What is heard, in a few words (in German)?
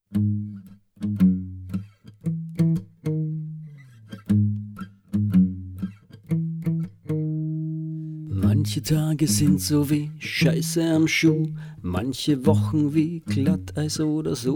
nirgends acoustic